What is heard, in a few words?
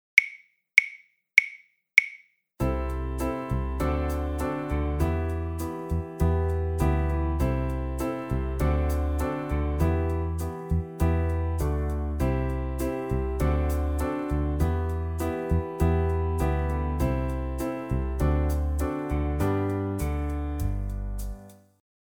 Backing Track